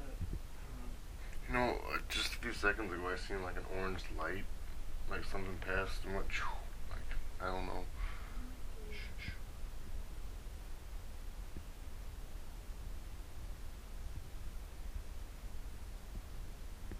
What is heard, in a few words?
voice hum female